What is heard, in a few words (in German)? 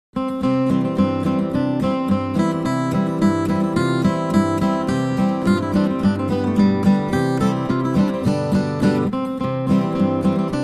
Marsch; Sid Meier's Railroads; Yorkscher Marsch Early